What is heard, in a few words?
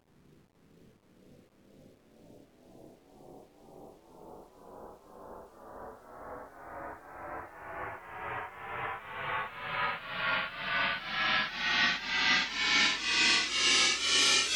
reverse cymbal; tonleiter